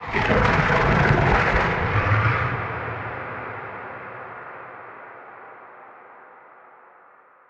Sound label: unknown creatures big snake; Sfx amb unknowncreatures